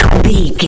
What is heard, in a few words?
retro weapon gun misc shot